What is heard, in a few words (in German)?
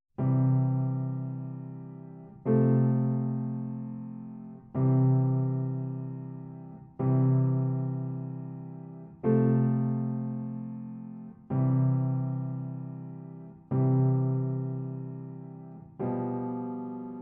Dominant Septakkord Vorbereitende Übung